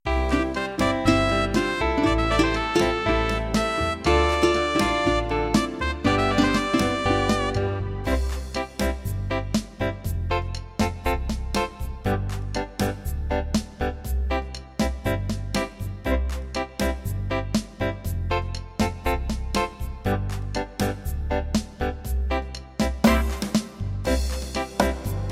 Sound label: Acoustic Rumba, rhythms; mzx500; preview pack 02